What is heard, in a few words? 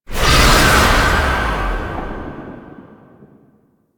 Impiety Impact; Warframe; Dagath